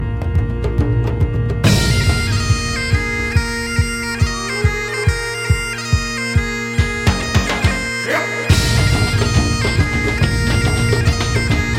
Bagpipes

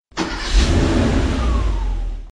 Car Start

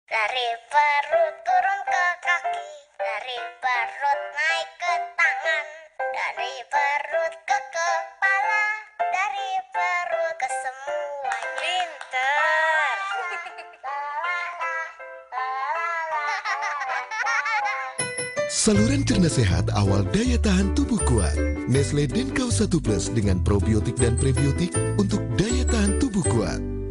Hahaha

